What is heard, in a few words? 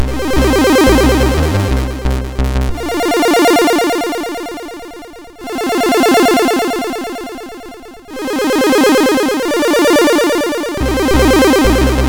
Mic Tune, MOS6581R2